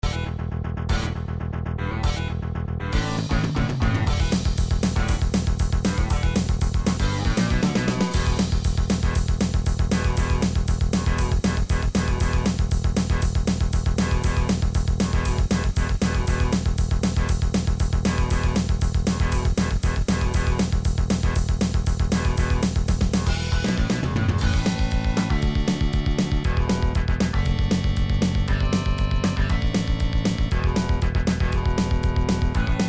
metal

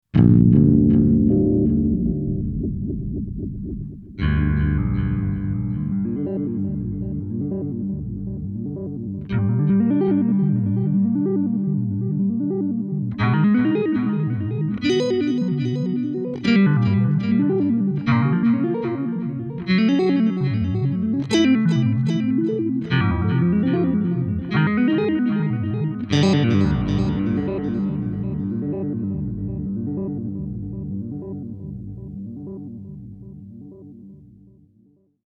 Dark Dream Sequence

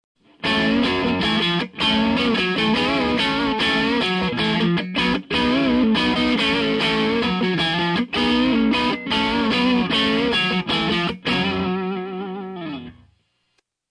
RG75 Vintage Gain

